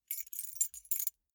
key ring small rattle

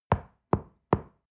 stone wall knock

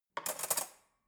cutlery fork drawer handle

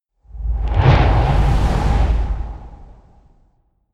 whoosh flutter

